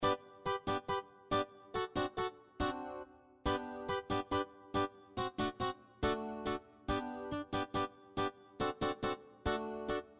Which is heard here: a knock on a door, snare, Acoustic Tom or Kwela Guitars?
Kwela Guitars